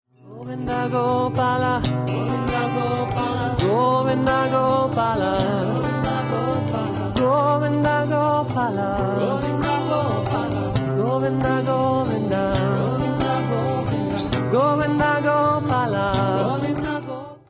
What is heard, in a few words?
Govinda Gopal, Guitar